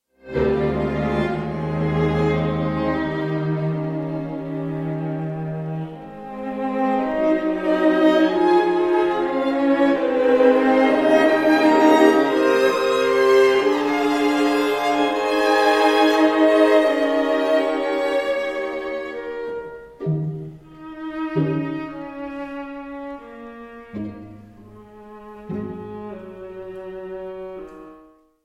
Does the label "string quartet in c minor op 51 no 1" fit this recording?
Yes